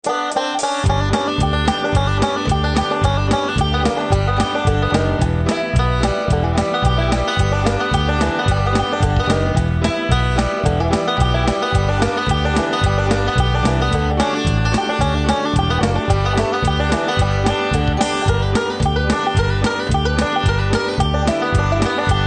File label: Banjo; Course Songs Sample